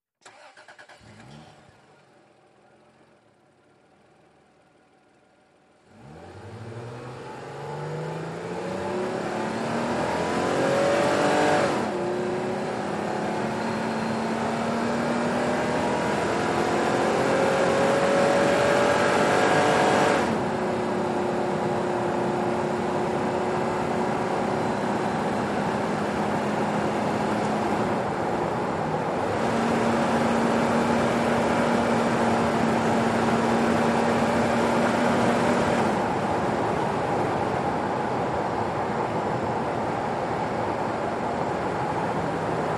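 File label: SUV Engine Accel 100mph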